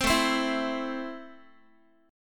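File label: A Minor, Guitar, chord strum; Am strummed; JGuitar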